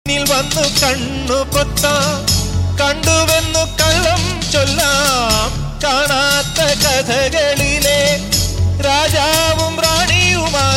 old malayalam melody songs

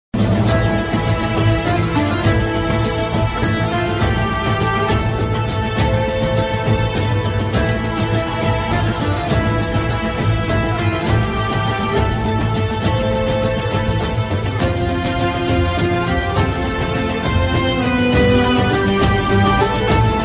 turkish famous ringtone; kurulus osman

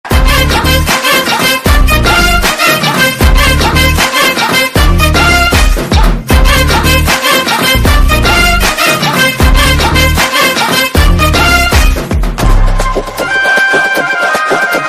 song ringtone bgm ringtone; the goat movie

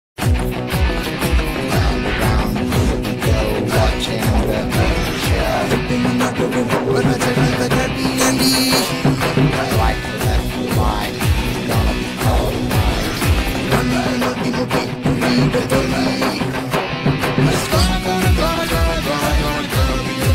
theme music; best actor; malayalam ringtone; mammootty

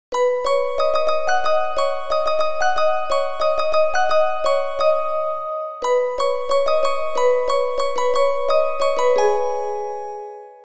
bgm piano; nenu local; super khiladi 4

